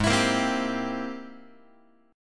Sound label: G sharp Augmented Major 9th G sharp Guitar; G#+M9 strummed; x,4,7,8,4,6; JGuitar